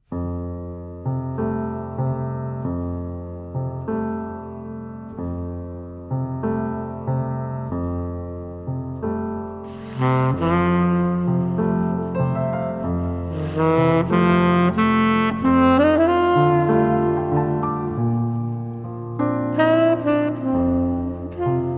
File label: Improvi; Zwischen Raum und Zeit